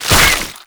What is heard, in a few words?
detach, headcrab; creatures; headcrab classic